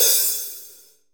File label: HH MED, DRY KIT, Real Mega Drums